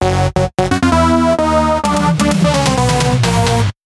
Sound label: Electro msg